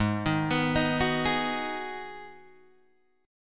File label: G sharp Minor 7th G sharp, Guitar Open D